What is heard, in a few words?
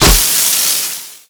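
water pipe burst; Call of Duty Modern Warfare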